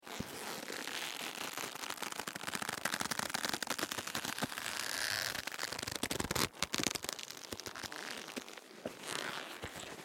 Clothes Leather Jacket Twist Long Creak ShotgunMic; Guild Wars 2 (Original Foley Soundtrack)